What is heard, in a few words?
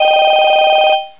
visitor call